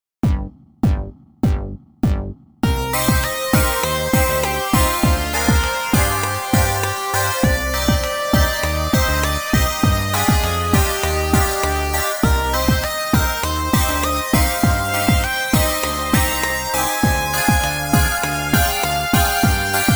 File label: MIDI ditty